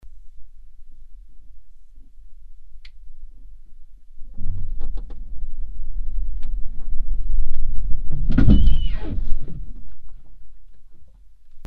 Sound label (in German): Streifkollision; Experimentelle Untersuchungen zur akustischen Wahrnehmung von Kleinkollisionen